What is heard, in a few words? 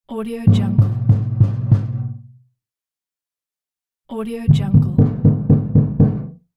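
knocking at the iron door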